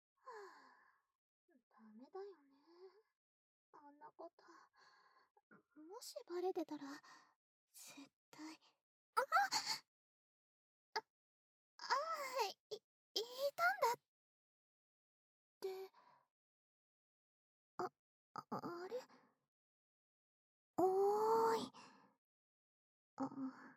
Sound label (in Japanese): 耳舐め手コキ